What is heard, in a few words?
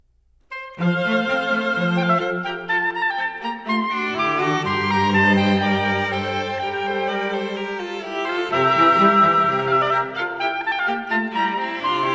Quartet For Oboe, Violin, Viola and Violoncello in F Major, Allegro; Chamber Works For Winds And Strings